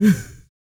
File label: LAUGHS; Spectrasonics; Vocal Planet; Jazz FX